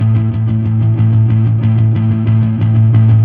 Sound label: Fuzz A harm; Torchlight III; PS4